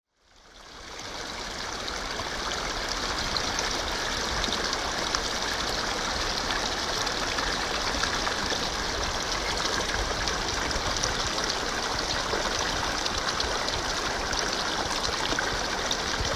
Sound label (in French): le gave de pau à gavarnie